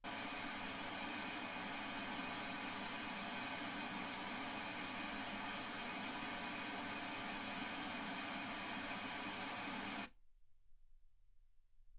wgn 48kHz

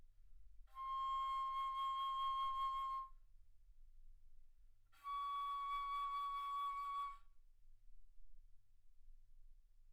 Bass Flute ff C6 Db6

